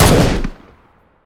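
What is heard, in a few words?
vstrechnij boevoj vistrel, oncoming combat shot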